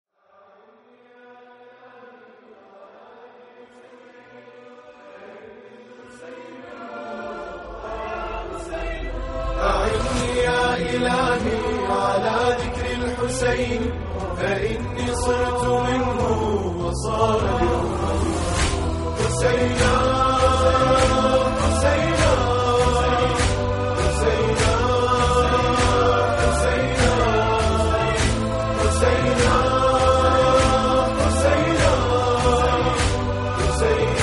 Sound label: nawha arabic; NAWHA 1444